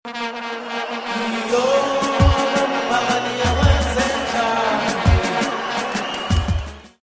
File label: With Vuvuzelas And Crowds